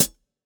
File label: Acousti Hat Hit, hihat; ultimate hihat; Hits